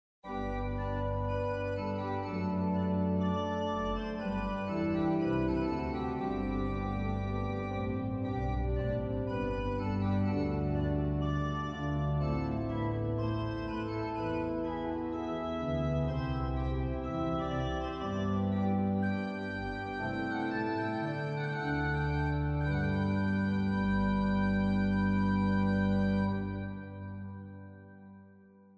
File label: Zwolle original